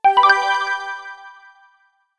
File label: Correct Answer